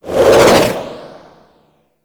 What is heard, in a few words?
melee charge windup; Warframe; weapons